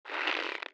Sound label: drag back fast